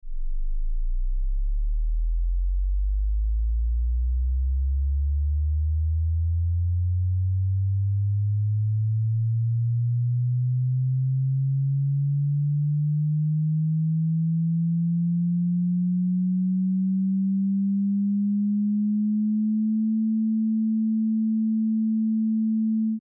Sine Sweep

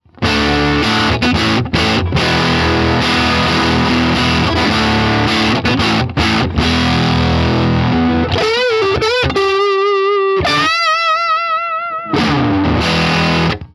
Reverend Neck Dirty

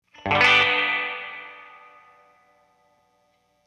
spring starfire hit; Valve Train Spring Thing

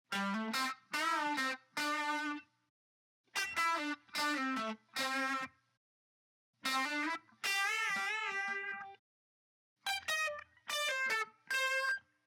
Stacked Guitars

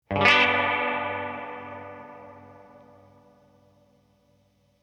Reverbulator long starfire hit; Demeter

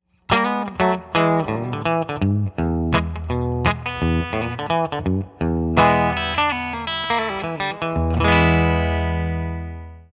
horn round

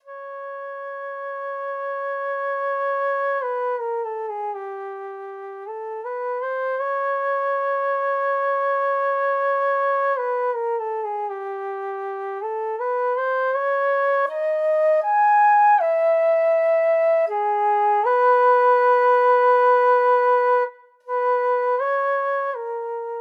SWAM dry

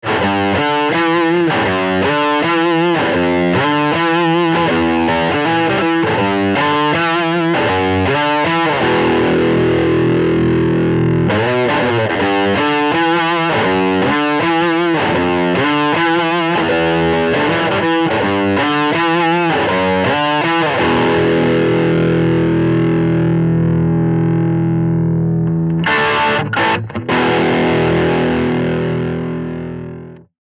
OCD into OD9; How to Get a Fatter Guitar Sound with Cascading Overdrive

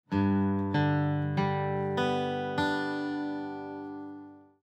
arp horn clear

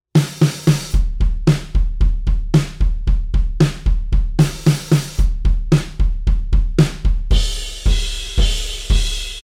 drums no processing

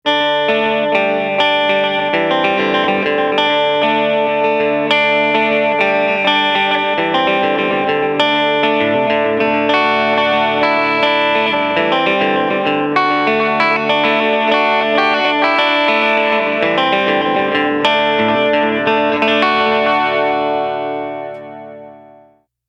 sdd 3000 blue dano pop

